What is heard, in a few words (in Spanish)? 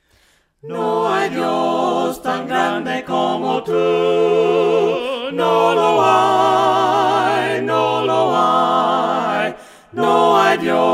VOCES, himnos a capella; cantos del camino; NO HAY DIOS TAN GRANDE COMO TU